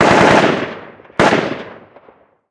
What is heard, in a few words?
gun ak47 med; amb elements; modern warfare 2